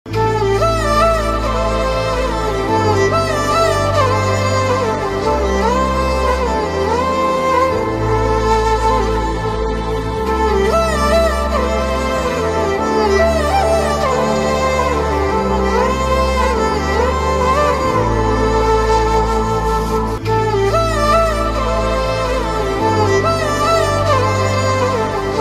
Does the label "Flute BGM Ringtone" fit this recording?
Yes